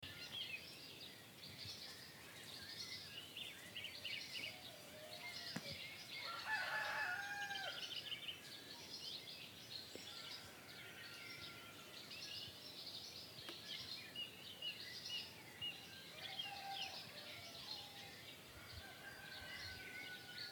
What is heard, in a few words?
Bird Chirps; Outdoors; el colmillo de cobre